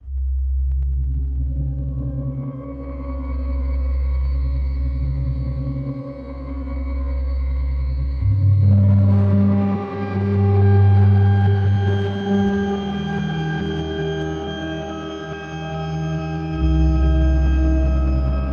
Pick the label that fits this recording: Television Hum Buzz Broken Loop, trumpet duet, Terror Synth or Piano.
Terror Synth